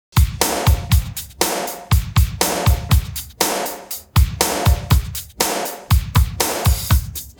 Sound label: pattern, drums